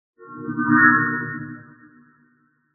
notification chord major third flutter slight overtones ascend; Skyclad Sound